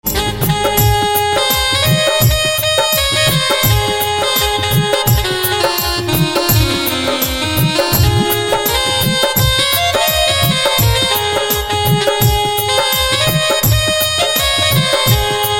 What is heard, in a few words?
Pianica